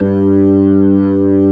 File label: B3 G, ORGAN; AKAI S-Series CD-ROM Sound Library VOL-8